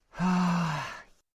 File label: Sigh; 707